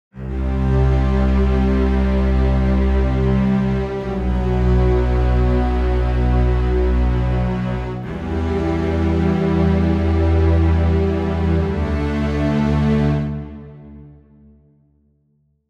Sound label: Celli Basses processed; type B 7 ips